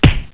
HIT; The Ultimate Guide to Mortal Kombat

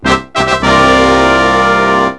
win

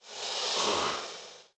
liz hiss, desert

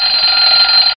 Ring; antz

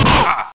die

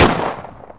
Shotgun Fire; worms2

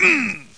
ungh

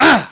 BL OUCH

